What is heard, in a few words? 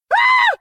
Scream; Prepotente